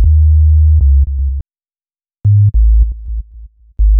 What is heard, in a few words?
Synth Bass; Basses